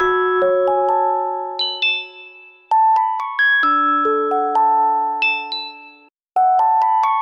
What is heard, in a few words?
keys